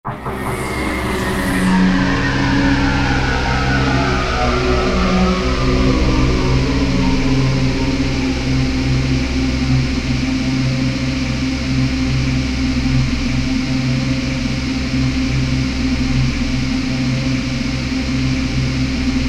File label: twirler